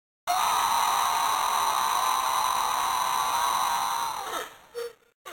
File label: screams